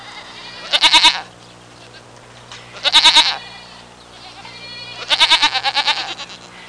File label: Goat bleating